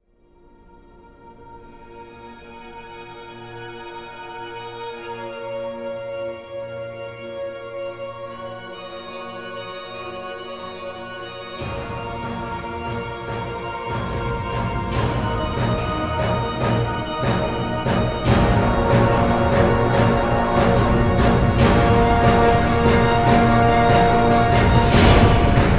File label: Dark Church; holy avatar vs maidens of the dead windows gamerip 2013